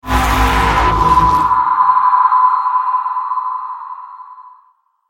Accent; Vacuulus

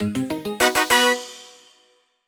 Jingle Spare; clubhouse games 51 worldwide classics 2020 switch gamerip

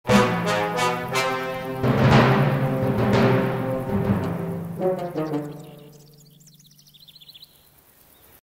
Spawn Jingle; Asterix at the Olympic Games

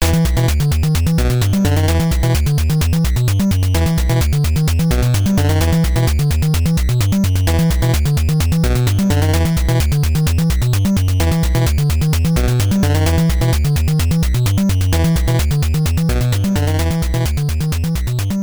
RPG Mode End

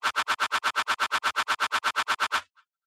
sword shield; Mystical Ninja Starring Goemon

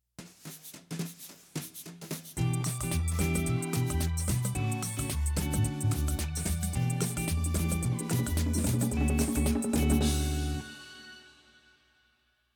Ten Second Count Music; Act It Out A Game of Charades; PS4; Wii U

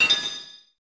Game Start; Air Combat 22; Namco System Super 22; arcade; Bomb the Start

